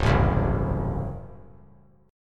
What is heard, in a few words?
C sharp Major 7th Suspended 4th Sharp 5th, C sharp; C#M7sus4#5 strummed; JGuitar